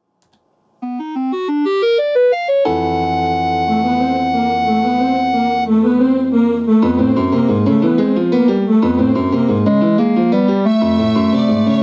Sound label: opening music; Subcut